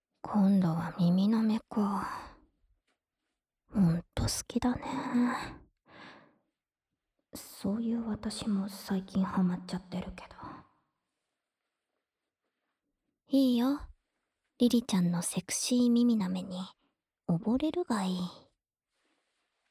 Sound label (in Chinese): 舔耳循环（左）, ASMR